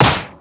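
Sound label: foul kick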